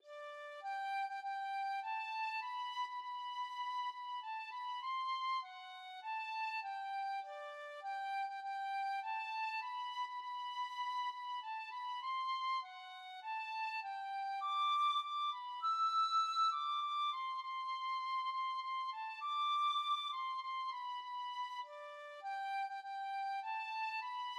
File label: tin whistle